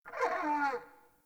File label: Animal Rescue Trapped Fawn Call; Encounters